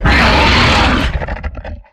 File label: Exertion Heavy, Behemoth; Evolve